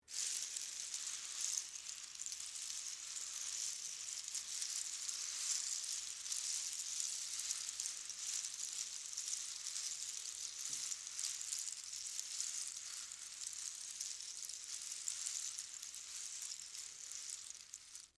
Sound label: Movement Pasta Shells, Texture, Movement, Pasta, Shells; Food Sound Effects; Food (Sound Effects:Household); BlastWave